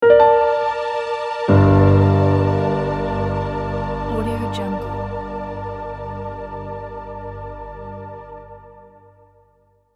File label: tragic sad